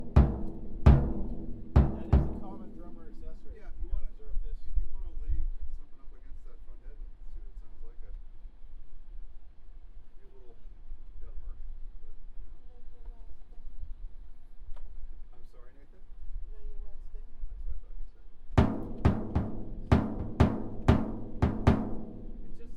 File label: whale song